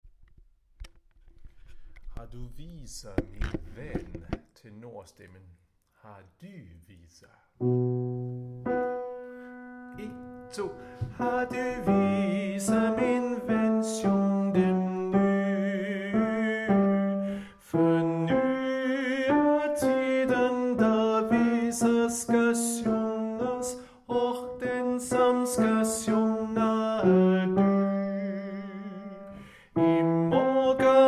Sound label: tenor; harlev koret